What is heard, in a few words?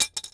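metal drop Concrete small; objects; Star Wars Obi-Wan; XBOX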